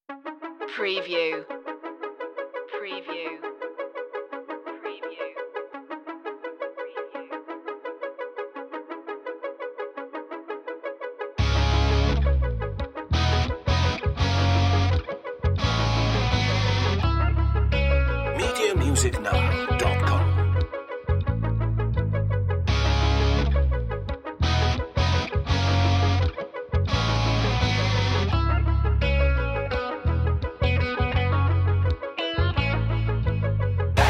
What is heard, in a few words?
groove the street no drums or sound fx